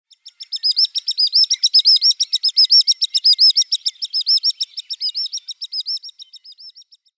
Flussuferlaeufer Actitis hypoleucos; Vogelstimmen

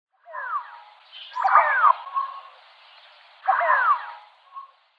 Bergente Aythya marila; Vogelstimmen

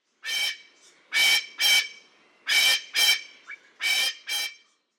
Diamantfasan Chrysolophus amherstiae; Vogelstimmen

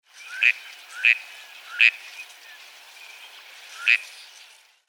Schnatterente Anas strepera; Vogelstimmen

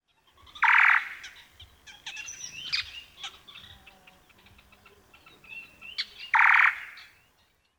Rotbauchwuerger Lanarius atrococcineus

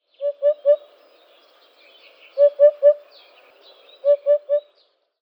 Wiedehopf Upupa epops; Vogelstimmen

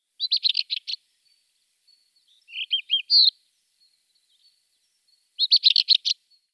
Heckensaenger Cercotrichas galactotes; Vogelstimmen

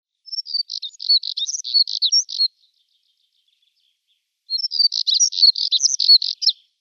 Diademrotschwanz Phoenicurus moussieri; Vogelstimmen

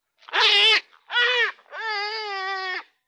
Europaeischer Feldhase Lepus capensis; Tierstimmen

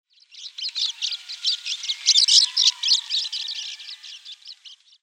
Felsenschwalbe Ptyonoprogne rupestris; Vogelstimmen

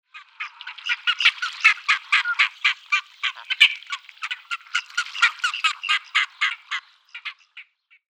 Moorente Aythya nyroca

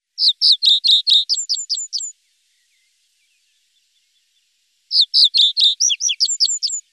Rohrammer Emberiza schoeniclus

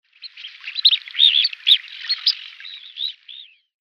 Thorshuehnchen Phalaropus fulicarius; Vogelstimmen

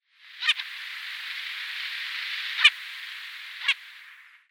Wilsonwassertreter Phalaropus tricolor; Vogelstimmen

